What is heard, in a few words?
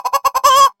chicken cluck; Farming